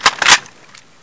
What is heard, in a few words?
ak47 clip in; cstrike; DEX